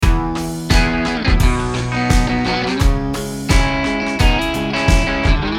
Rock Loop

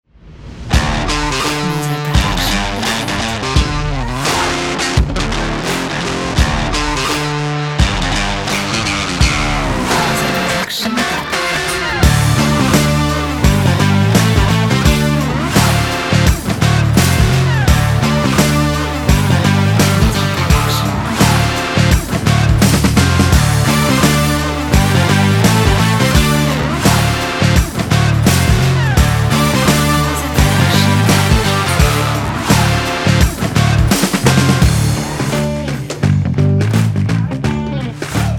Powerful Energetic Indie Funk Rock

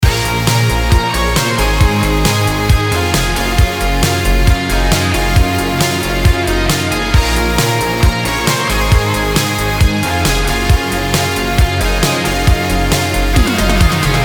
Arcade Loop

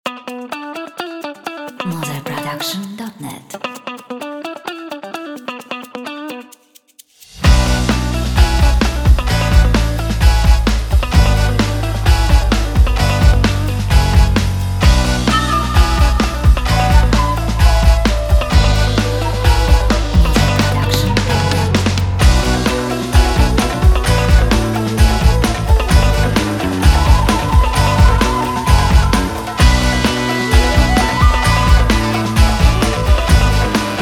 Expressive Vintage Funk

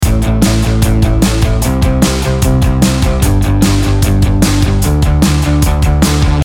Rock Beer Party Loop

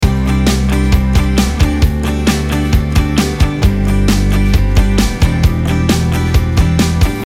Pop Rock Loop

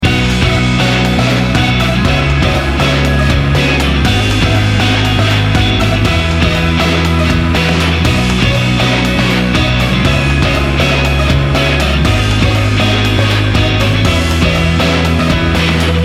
Indie Inspiring Rock Loop

